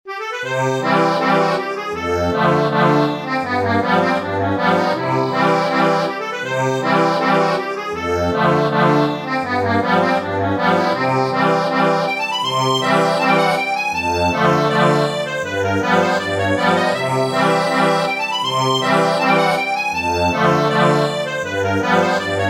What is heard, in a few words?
ACCORDION